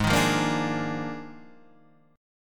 D sharp Major 7th Suspended 2nd Suspended 4th, Guitar; Gsharp; chordshape